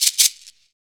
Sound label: SHAKER, Afro Shakers, Shake&Rattle